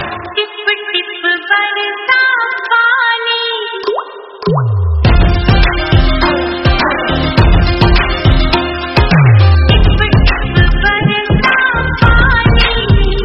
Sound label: Remix Ringtone